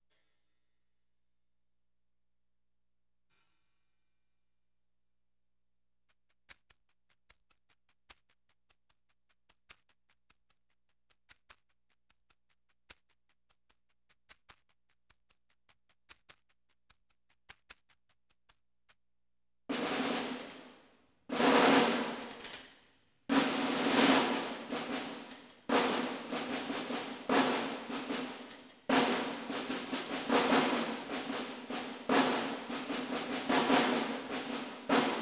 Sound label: percussion only